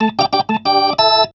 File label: B3 LICK G, PLAYED